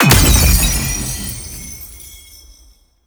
Tear Enemy Explosion; Warframe; Cephalon Weave

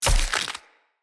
Claw Small Impact Flesh; Weapon Impacts; Enemy; Unarmed

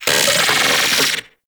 Arachnoid Wraith Vocal Pain; Warframe; Corpus

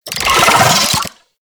Wall Climb Movement Vocal; Warframe; Oraxia

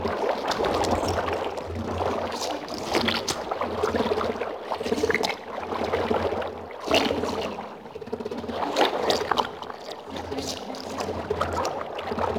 Infested Eris Interior Caves Vein Loop; Warframe

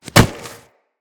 Mantle Foley; Characters

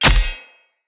Sword Impact Flesh; Weapon Impacts; Short Sword; Combat; Local Player

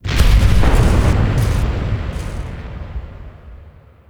Void Storm Hull Impact; Ambience; Warframe; The New War; Zariman

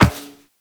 Operator Walk Metal Perforated, Footsteps; Warframe

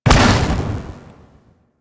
Amp Barrel Fire, Amplifiers

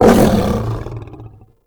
Cast Impact Vocal; Warframe; Uriel; Remedium

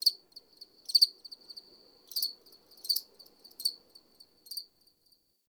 Insect Night; Warframe; Eidolon Plains; Ambience

